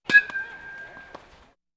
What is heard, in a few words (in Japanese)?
バッティング